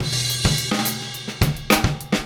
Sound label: Straight Kit, World Pop Beats